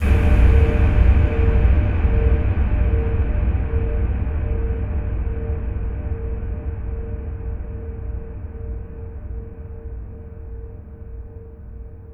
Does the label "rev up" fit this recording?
No